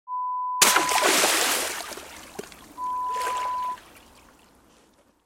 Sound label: splash